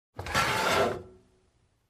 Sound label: Metal grinding